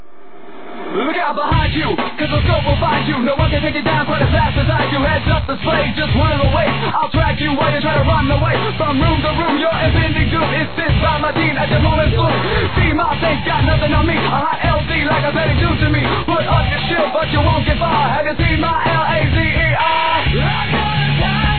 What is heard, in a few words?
song no fx